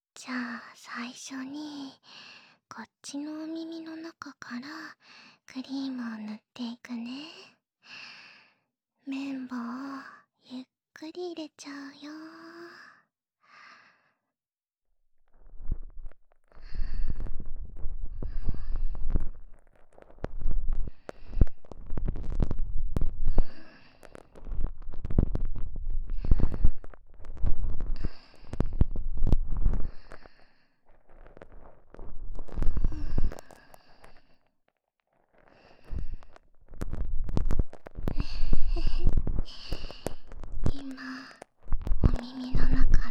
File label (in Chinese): 用棉签和手指进行色色的掏耳, ASMR